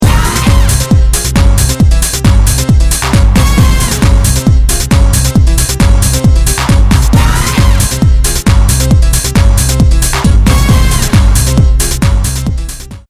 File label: latin house